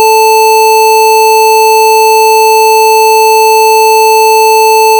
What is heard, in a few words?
sine 440Hz average square 880Hz